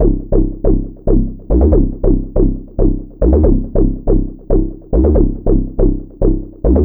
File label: Trance Bassloop 140bpm, Bass Arps